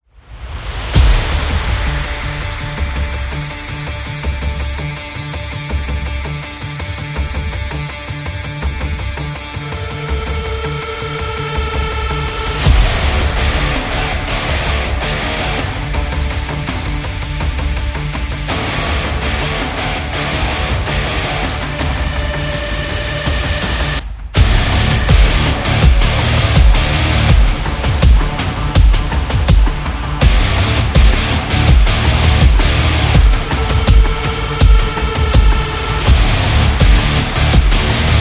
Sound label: Powerful